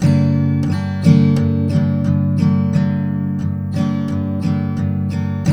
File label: TK MIN G#2, Guitars; Spectrasonics; Hans Zimmer Guitars VOL-1